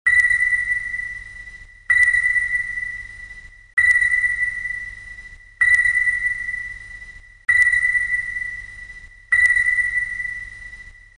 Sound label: sonar ping effect